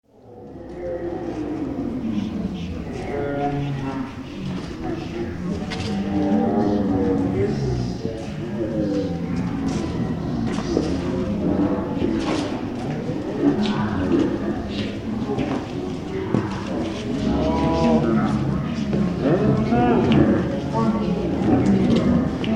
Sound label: Wedding Guests at end of celebrations leaving venue; passing by; Crowd Sound Effects